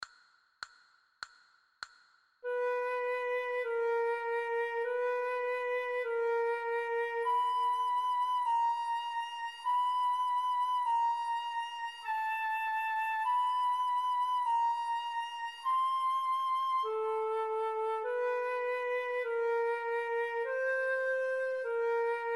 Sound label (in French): Si b, flute a bec